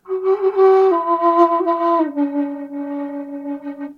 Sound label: bamboo flute, music samples; Coyote Oldman Anasazi-style end-blown flute, 2011.